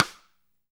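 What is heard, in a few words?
snare drum rim; Best Service Gigapack 2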